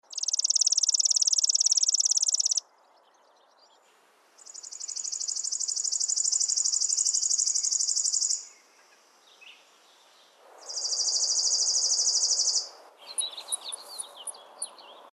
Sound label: chipping sparrow; calls; Audubon